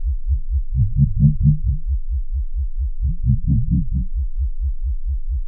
Noise, LFO & Noise

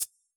maracas, Yamaha DJX Rock Kit

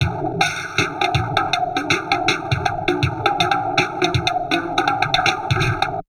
DIST, Drum Loops Crazy Processed

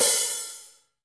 HH hh; Best Service Gigapack 2

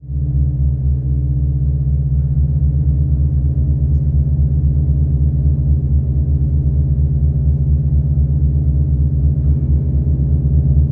drone, Pipe Clusters; E-MU Producer Series Vol. 5 – 3-D Audio Collection; 3DSFX

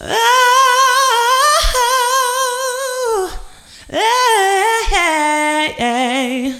AD LIB AHH, Female Vocals; PlatinumPhattCD2; E-MU Producer Series Vol. 8 – Platinum Phatt

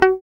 GUI MUTE, E-GUI MUTED

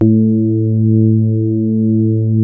DX PIANO; East West; Twisted Textures-BT

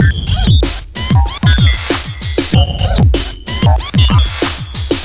scratch n shift

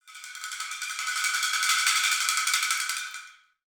ROT DRMS, ROTATE DR; Spectrasonics; Heart of Africa 1

